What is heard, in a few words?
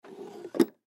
Jewelry Box Drawer, Jewelry Box Drawer Close; Drawers; Household; Foley; Blastwave